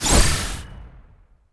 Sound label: sfx skill, ZombieSkill SFX; IdleRPG2024